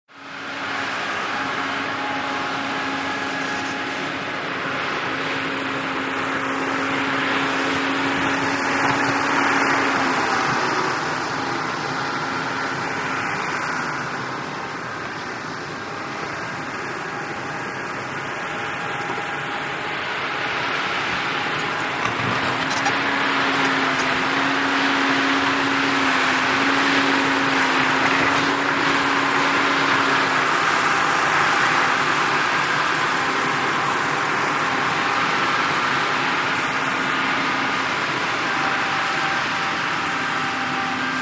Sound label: VEHICLE - CONSTRUCTION JOHN DEERE 750: EXT: Narrow perspective, close and distant movement, engine strain, rough terrain.; 750 Dozer